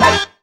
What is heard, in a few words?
BUBBLE HIT; Zero-G; Funky Elements